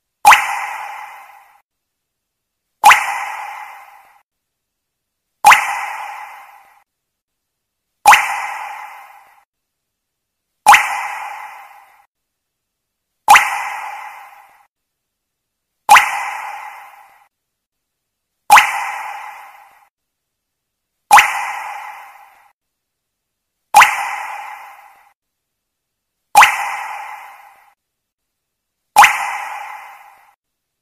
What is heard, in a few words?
falling water drops acoustic ringtone